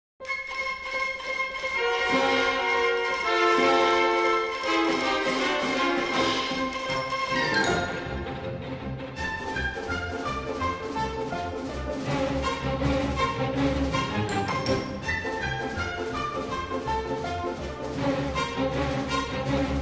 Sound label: Galop